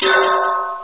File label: glang, ambience